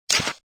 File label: click, shutter; Kyocera; KX5 Slider Sonic Virgin Mobile